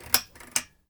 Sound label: Pull chain slow on